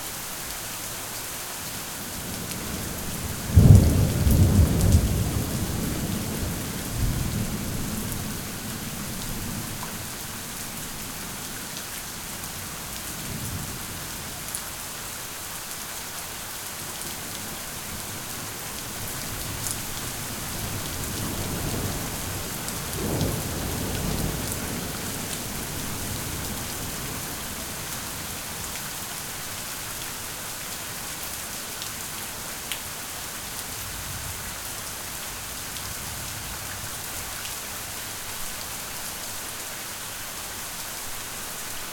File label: rain Loop